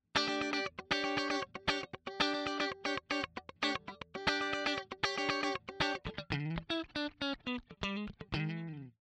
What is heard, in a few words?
Funk more treble DI Balaced Out